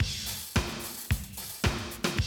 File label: Classic DrumLoops, 110